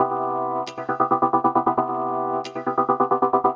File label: Rhodesy sequence; SYNTH AND ELECTRONIC LOOPS